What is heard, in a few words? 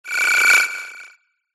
science fiction console alert ring warning; zapsplat